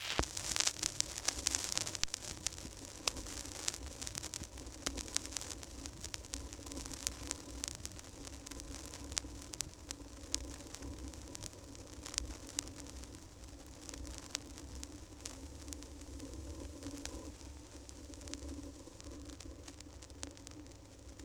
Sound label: Vinyl Hiss Cracks And Pops